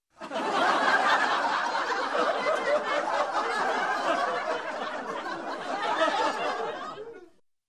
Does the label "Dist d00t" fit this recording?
No